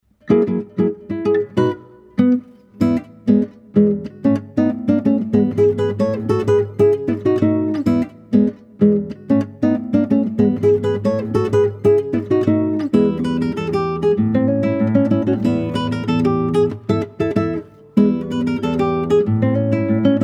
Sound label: Ground Background Music, guitar; Super Mario Bros.; super mario series for guitar 2010